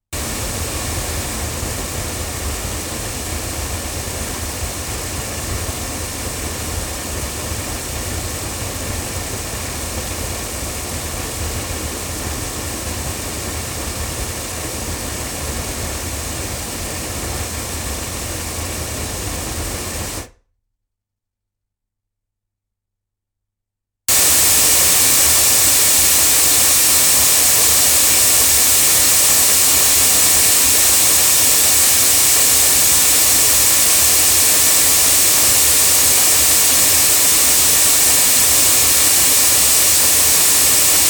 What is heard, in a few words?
Oktava MK012 cardioid loudspeakers